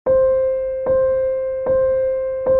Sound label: Step Step Notification Ringtone